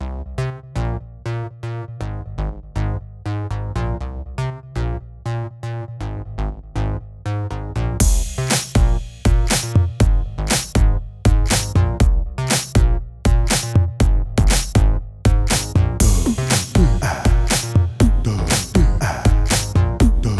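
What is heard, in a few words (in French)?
Une souris verte play-back sans claps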